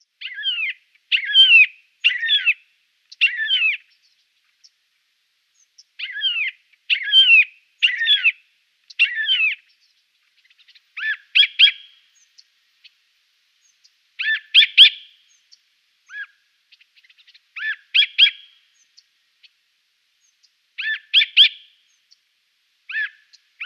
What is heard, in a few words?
Siberian Jay calls